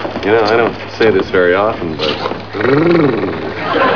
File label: grrr